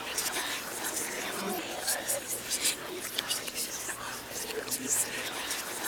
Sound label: WHISP REV, whispers